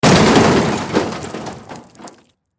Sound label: Impact Crash Metal Car Drop; Materials